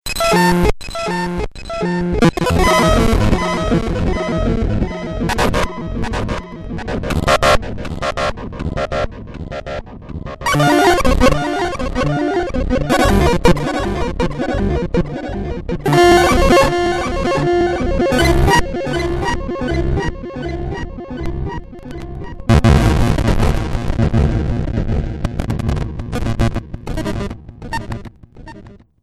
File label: 8 Bit Style Glitch Drone; Video Game